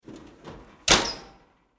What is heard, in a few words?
Household Stove Door Close Oven, kitchen